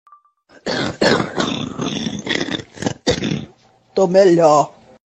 sick family coughing; audio meme

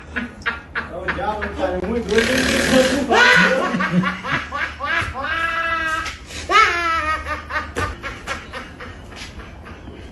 hysterical laugh; audio meme

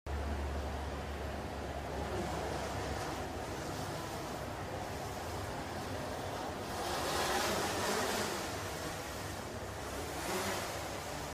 throwing a slipper at the drone; audio meme

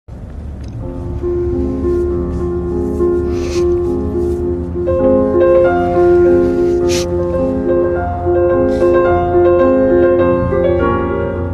dude crying; green screen audio meme

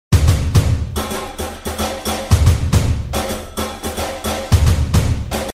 hype cat dancing on beat; audio meme

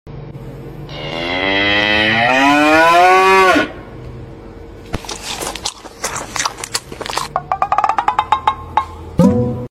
capybara yawning; audio meme

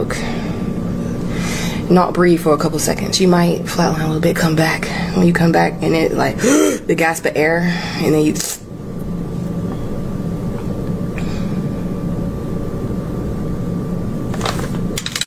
gasp of air; audio meme

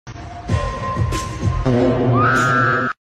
farts; green screen; audio meme

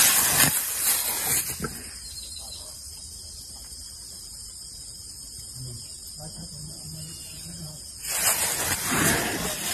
cow eating then pausing; audio meme

